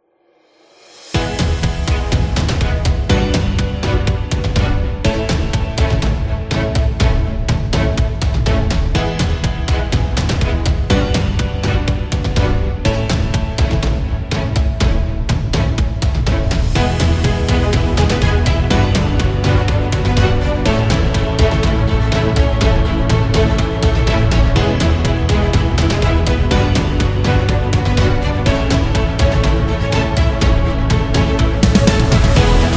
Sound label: Dynamic Corporate Cinematic